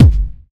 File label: nice bass drum sample g sharp key